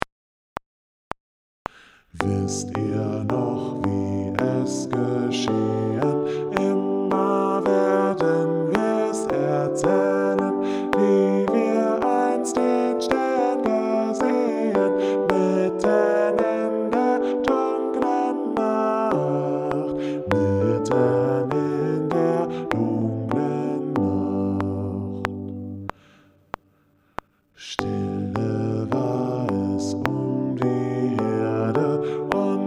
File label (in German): Bass